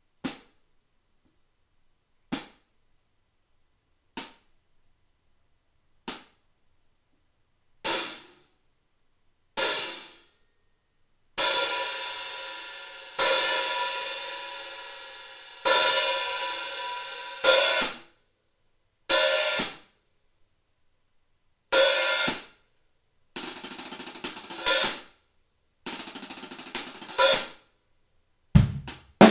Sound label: Hihat 14; Tigris; Amedia